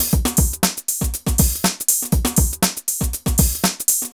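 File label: drum loops, right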